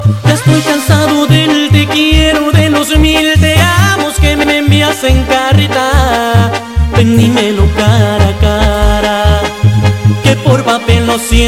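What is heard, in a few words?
Spanish Ringtone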